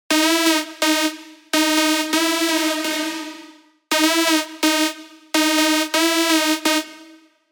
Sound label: LD, serum 2 bass tech house; revealed serum 2 bass tech house vol 1